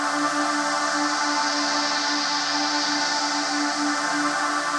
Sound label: Pad Loop D, shimmer and sparkle; Pad Loops